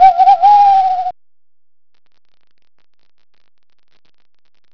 bird; quake2

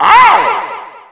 pain; quake2; redux; Hueteotl

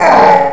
pain; quake2; coop

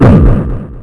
fall; quake2; tourney; Hueteotl

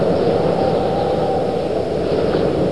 windglid; quake2; mnhcoop

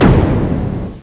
guilotin; quake2; coop

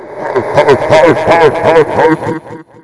pain; quake2; Hueteotl

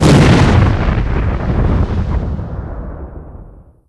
turret; quake2; mnhcoop

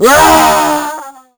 fall; quake2; ctf; omega

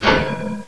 grill; quake2; coop

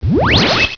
pain; quake2; battlebrowzer

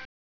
action; quake2; coop

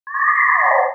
alarm; quake2; mnhcoop

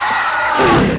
fall; quake2; railz; insect

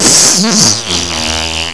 pain; quake2

